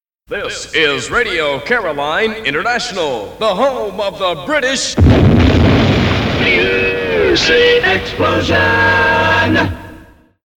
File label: jingle